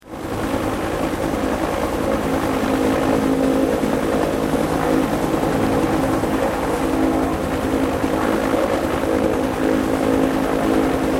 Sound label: Helicopter Motor Blades Running; use for flying, landing etc.